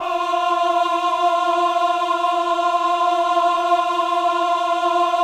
VIB F4, VIBRATO, Voices; Spectrasonics

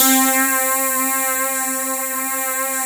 NASTY WAVE, SYNTH

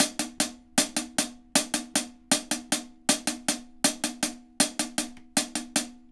HAT, HI HAT LOOPS, Dub; Drumdrops In Dub VOL-1

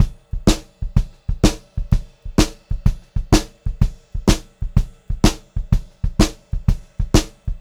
126 CL VARI L, Pop-Rock Drumloops; USB Soundscan vol.37; AKAI; 90 s Sample CDs

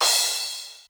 Crash, Korg KR-Mini, Drum Machines; 300 Drum Machines

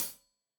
Hat Tight, Hi-Hat; Deeply Sampled Drums

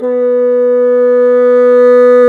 Bassoons; Roland LCDP04 Orchestral Winds; 90 s Sample CDs

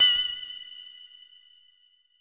SYN FX, PERCUSSION; AKAI S6000 CD-ROM - Volume 5

